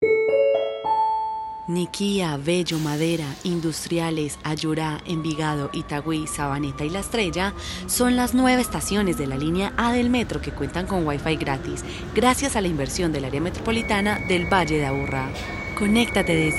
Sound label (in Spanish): Jingle Metro; internet gratis en el metro de medellin